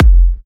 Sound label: Kicks F; markus hakala